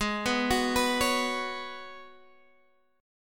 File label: G sharp Minor 11th G sharp Guitar Standard, chord; G#m11 arpeggiated; x,11,9,11,12,9; JGuitar